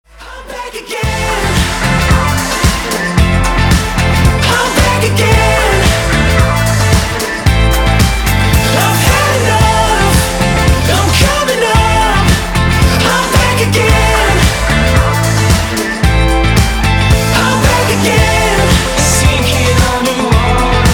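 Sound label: catchy rock cut for gadget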